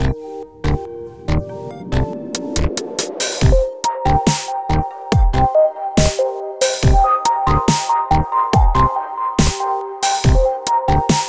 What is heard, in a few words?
falling forward loopable